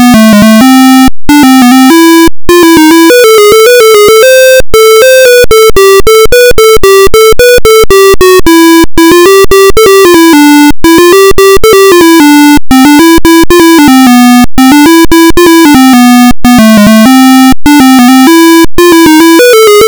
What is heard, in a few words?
menu; percy the potty pigeon